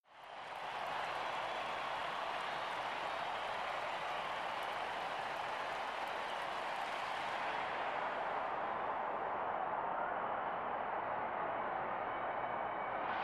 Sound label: Arena Crowd; Crowd Cheers Into Fast Transition To Cheers.